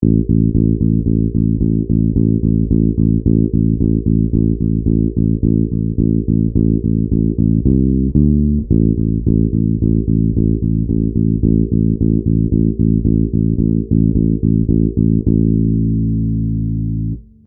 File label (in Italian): Basso; tempo 110; musiikki; Demostudio RJR